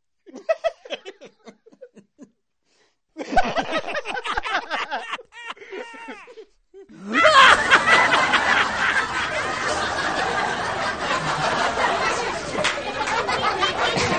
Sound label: laughter